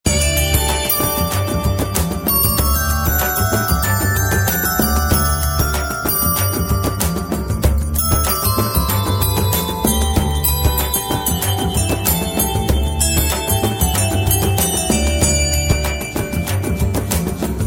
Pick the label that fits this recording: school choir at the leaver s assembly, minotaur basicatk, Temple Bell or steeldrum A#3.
Temple Bell